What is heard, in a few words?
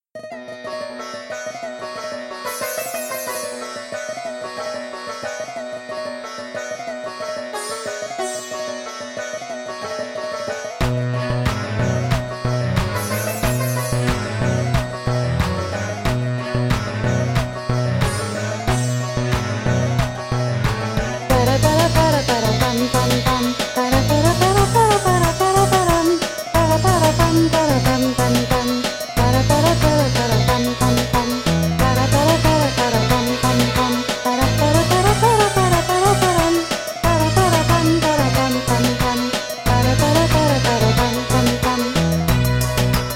Banghra